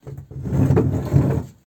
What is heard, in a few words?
Pushing Chair